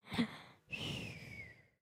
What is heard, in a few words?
Sleep, creatures organic; Lego; Strombie